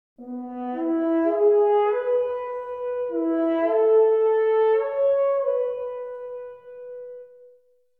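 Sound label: horn passage mod filter legato; expression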